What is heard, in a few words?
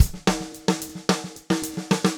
Black Maple Groove 110, DW Black Maple Kit, 110 BPM, Drums; Sonic Foundry (Sony Creative Software); Vital Drums